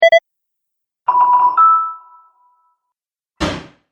Videogame Fx; Sound Pack 5; Video Game Sound Effects

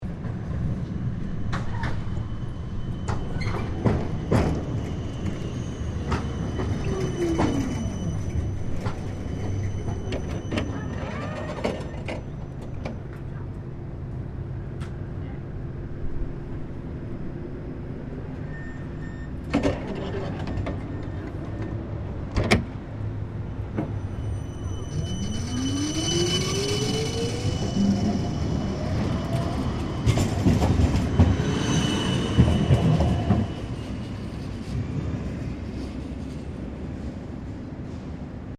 Tram Melbourne C Class Scheduled Stop; Various Melbourne trams; Streetcars; Sound Effects:Vehicles

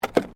Tram Horse Drawn Horse Step Gravel; Horse drawn tramcar; Carriages; Vehicles

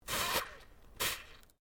FIREWORKS EXTINGUISHED INTO WATER

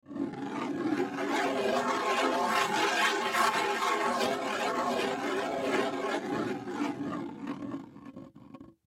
Hand Drum Scrape, Hand Drum Scrape Head; Drum Sound Effects; Blastwave

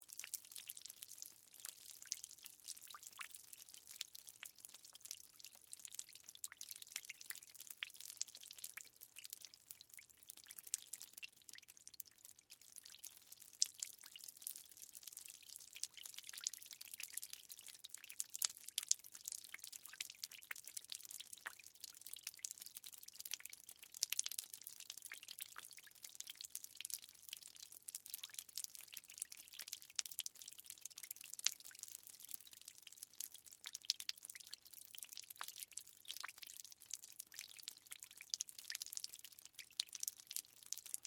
Water Drips Long; Nature

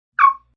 Chirp; Chirps

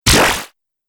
Laser Zap, Laser Gun

